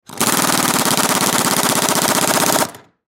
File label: Impact Drill, Impact Drill Tighten Screw Bolt Long Slow Pneumatic; Drills; Industry; Blastwave